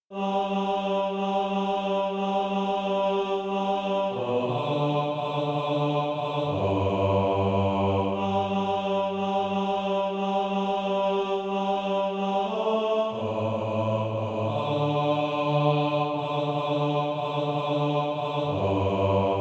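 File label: bass, Practice Music; A Little Talk with Jesus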